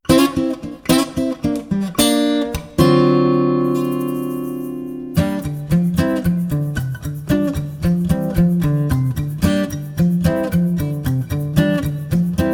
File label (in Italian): chitarra voce